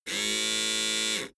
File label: servo steady